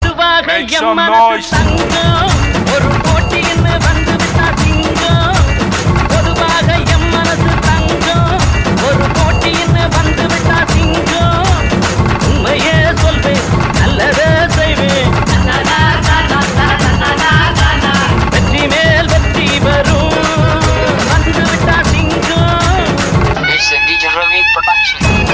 Tamil Remix

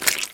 Slime death; Slime+death+Terraria

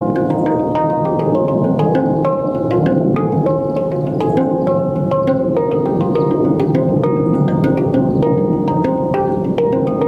handpan solo

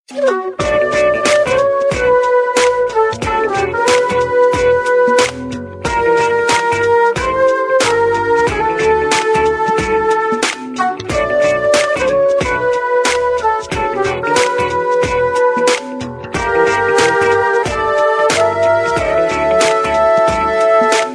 Fresh Flutes

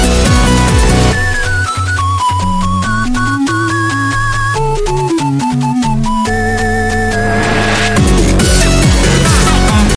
echo notification

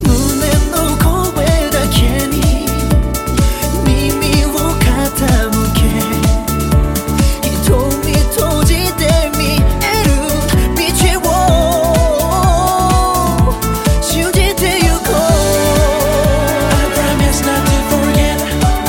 Longway Remix

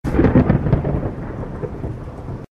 Thunder Bolt

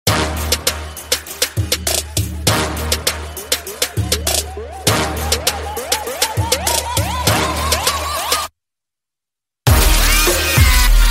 inst

